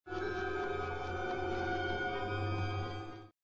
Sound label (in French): cuivre